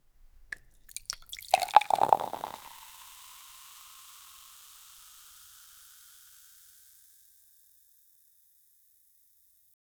pouring beer into a glass

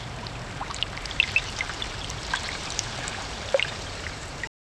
soft water lapping reed; aerial

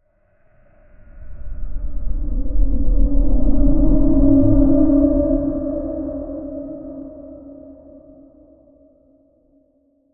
a deep monstrous roar ech, creature

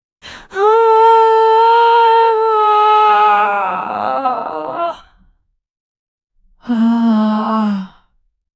low pitched, human vocalizations

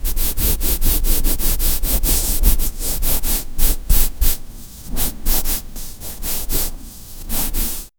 soft brushing sound on sk; textures

